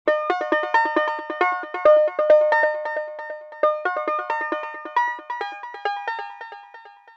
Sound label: Electric, Deurbellen